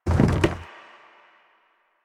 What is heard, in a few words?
Pylon Plastic Roll; Warframe; Nineteen Ninety Nine